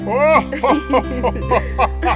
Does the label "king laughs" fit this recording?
Yes